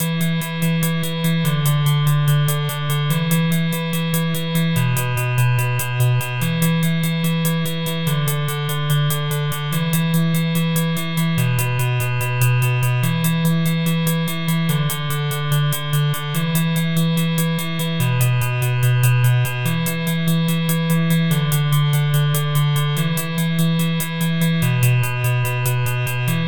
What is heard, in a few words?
level main loop; atelier godot layer; AlphaRaquette